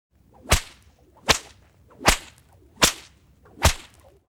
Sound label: crack bull whip; fights bodyfalls; Asian Show Sound Effects